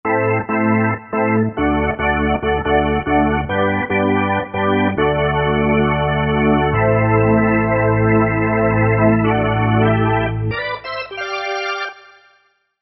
D-50 Organ, synth

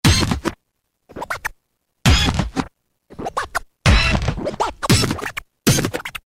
Stab Scratch; Roland M-DC1

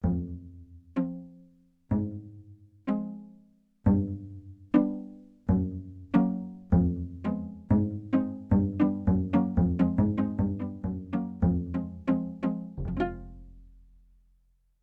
Low Pizzicato; Yamaha TX16W

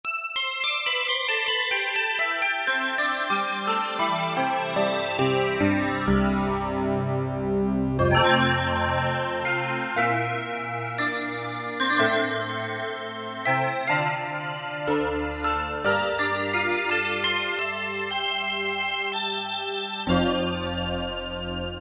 FESTIVE BELL, Casio VZ-10M; Internal factory combi

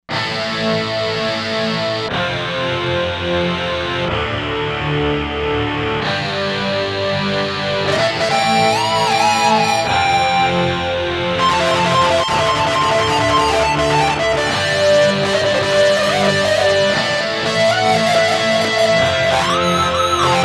Crunch Split

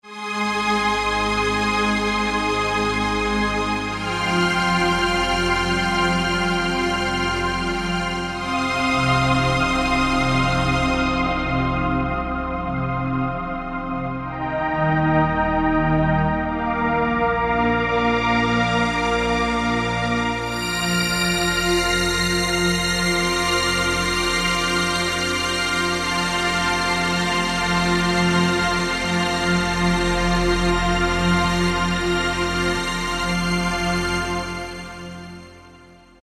Pad Epic, E-mu Morpheus; EpicMmnt

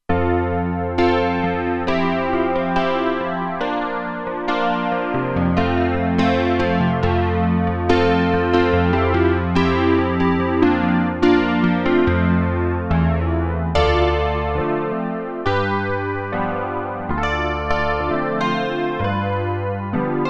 Piano

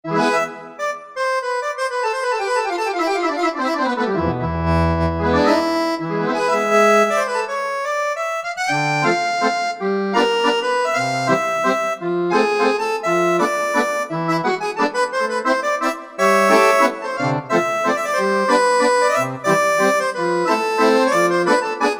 Accordeon

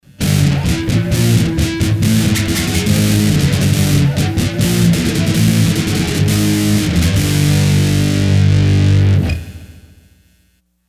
Metallic-A; Sony HR-GP5

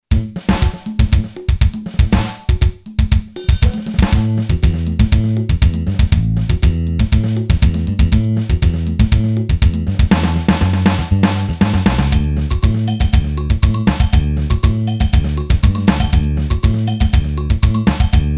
SAMBA, Boss DR-3

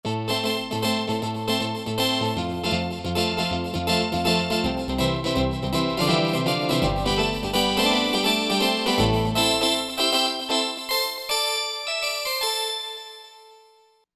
2 voices; Korg MSC-12S Synth 3

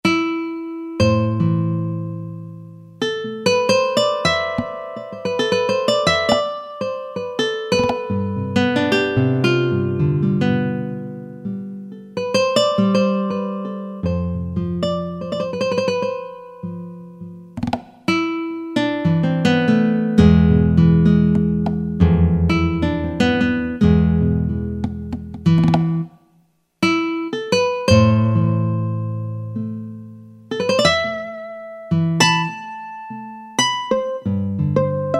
Nylon & Efx, Alesis Z7 Latin

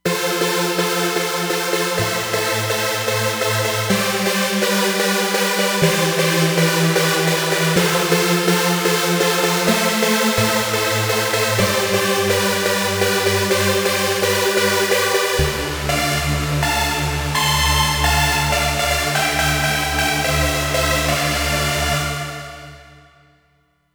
Trancy

